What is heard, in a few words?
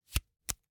Hwatu, card game, hard plastic, small, clatter, pull, slap, throw down, single card, soft; Eiravaein Works; Vaeyan IV